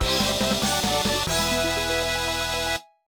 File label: Unknown Jingle; Shining the Holy Ark 1996 Saturn gamerip